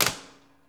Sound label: TESLA, Science Fiction; E-MU Producer Series Vol. 3 – Hollywood Sound Effects; Tesla 10 -19